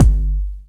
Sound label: Kick, Kickdrum; Equipped Music; Loungin House